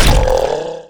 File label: hurt; minecraft; megaspud